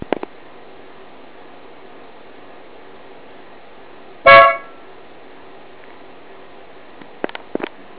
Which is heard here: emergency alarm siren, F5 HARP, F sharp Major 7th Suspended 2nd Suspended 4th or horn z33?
horn z33